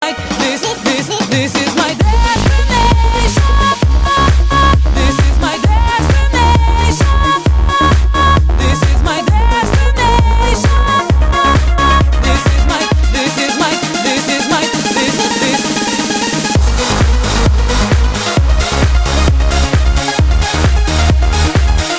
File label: retro